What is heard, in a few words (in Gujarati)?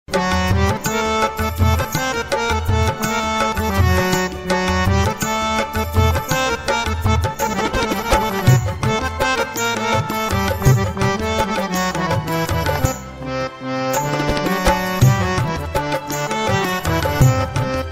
Kyamno Hu taro Bikhari Instrumental